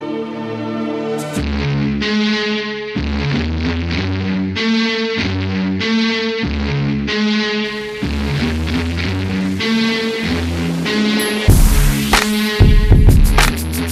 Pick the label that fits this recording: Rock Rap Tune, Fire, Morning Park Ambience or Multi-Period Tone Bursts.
Rock Rap Tune